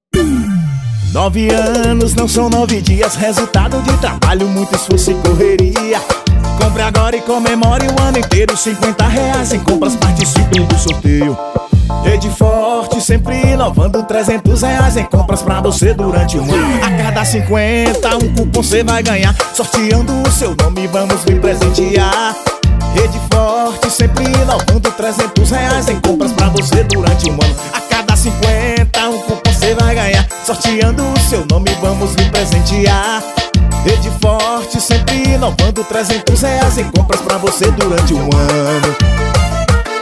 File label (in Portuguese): jingle; rede fort